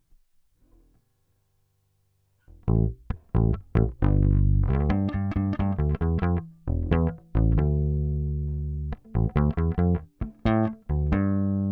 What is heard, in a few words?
Bass DI